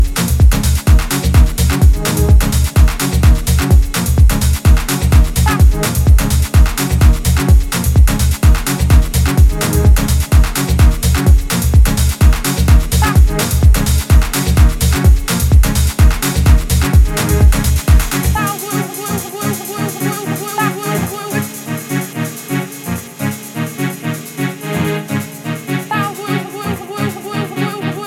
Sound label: drums